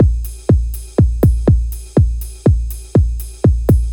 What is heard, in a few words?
Hard House